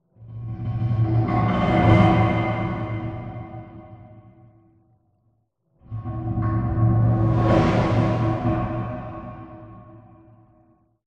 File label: Evil Metal Sweeps; horror and atmosphere fx; Silent Hill Downpour